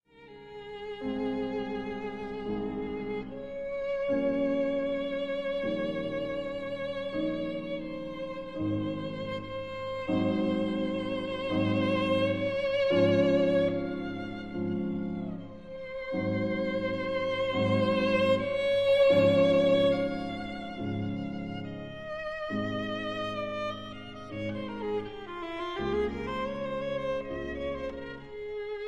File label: Sonata for violin & piano; 2. Adagio espressivo; No. 10 in G major; The Cockcrow; Op. 96